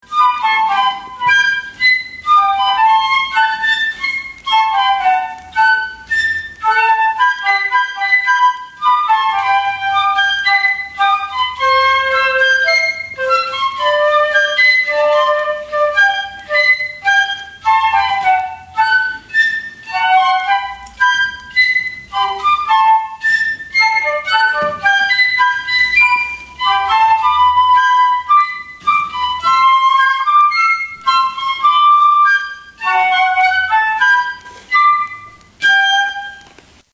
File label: piccolo